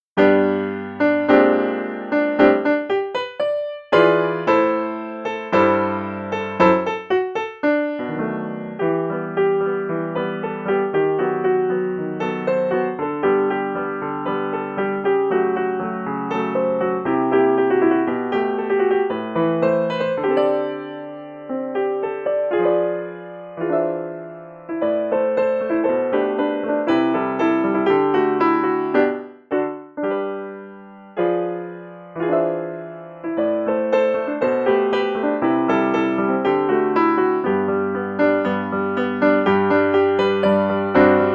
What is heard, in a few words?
piano